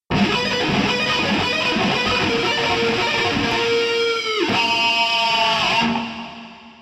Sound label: lick; brutal legend